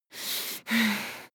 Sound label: breath female aroused